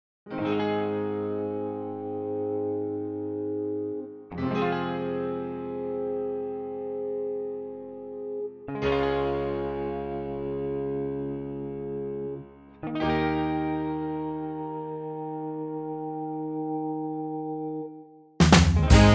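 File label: Ab, backing track; key - Ab - vocal range - Eb to Eb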